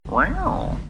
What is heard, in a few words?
rawr